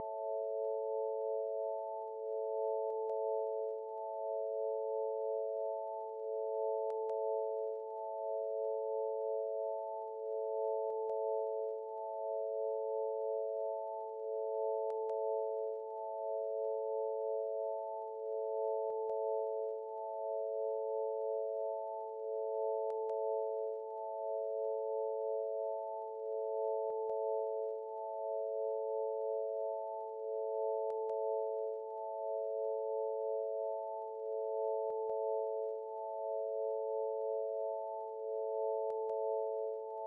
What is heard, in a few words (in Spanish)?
circo